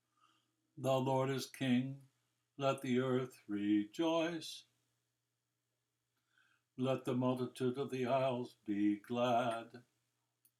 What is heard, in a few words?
Psalm 97